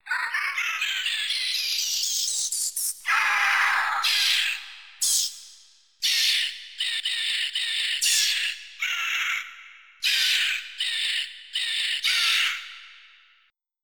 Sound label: bats; croc; AMBI CAST